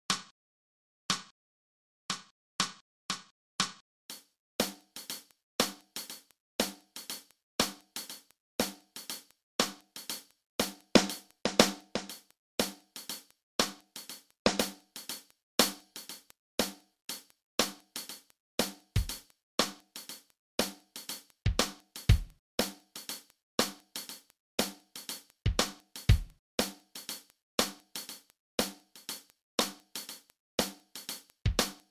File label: Blues Drum Track